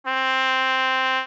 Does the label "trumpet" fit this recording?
Yes